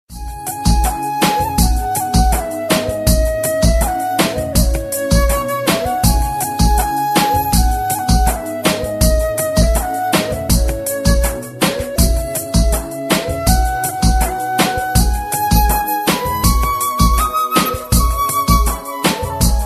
Remix Tone Ringtone, Tamil; 2009 Tamil Hit Ringtones

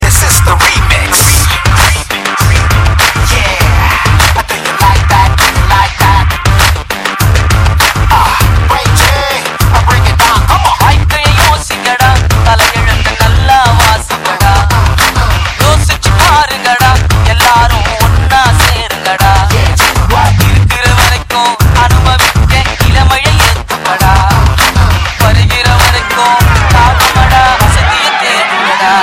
Heavy Beat Ringtone; Chennai; Tamil; 2009 Tamil Hit Ringtones

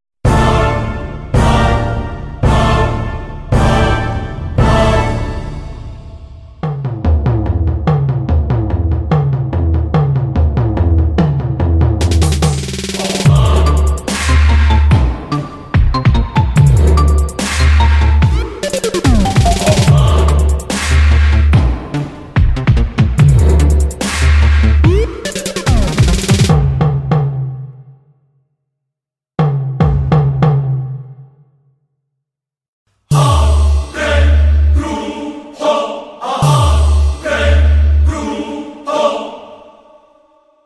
Organ; Yennai Arindhaal; Organ Trade; Tamil

